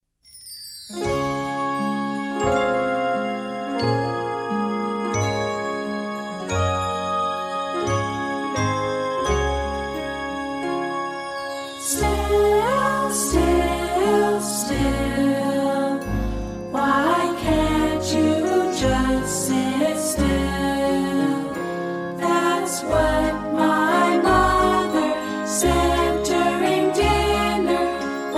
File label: vocal